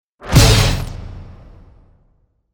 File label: boom